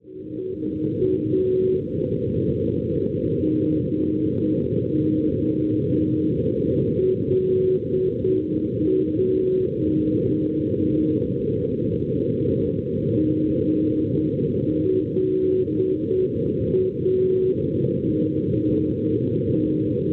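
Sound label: non-directional beacon 514 kHz; GO; Amari Air Base; Estonia; Schwarzsee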